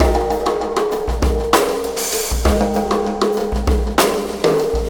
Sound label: Oscillation Beat